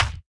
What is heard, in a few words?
ricochet impact; heroes vesper